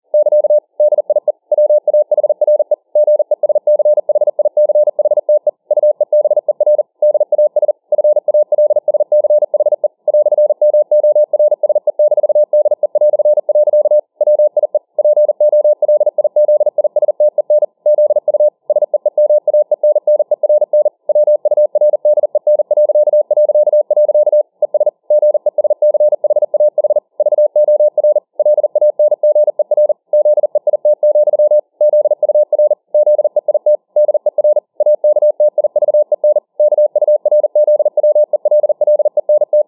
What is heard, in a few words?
Morse 40 WpM